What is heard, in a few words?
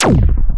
fire gun